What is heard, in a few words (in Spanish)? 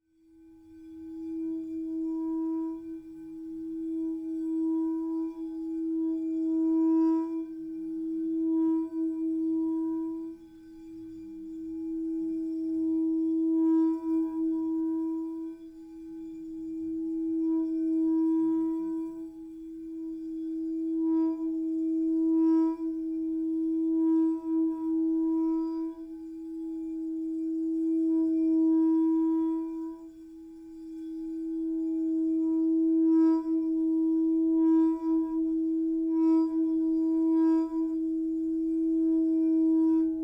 BOWL MI; sonidos de cuarzo; escucha y armoniza los chakras